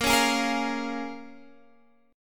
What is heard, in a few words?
Db 6th